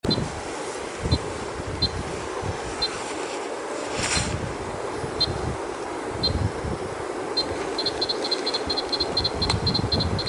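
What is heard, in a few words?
crinia tinnula, frogs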